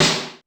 Korg 01W Dance Kit; 300 Drum Machines

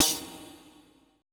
E TAMB, ETH PERC

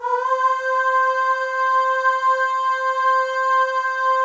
AHS, FEM; Spectrasonics; FEM.1 AHS

